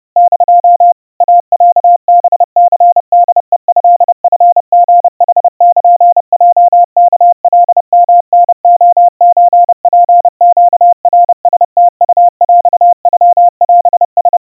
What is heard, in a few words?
iroha 100 cpm 700 Hz, Morse